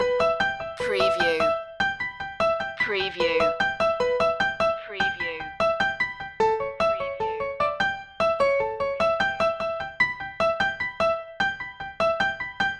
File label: latin flavor loop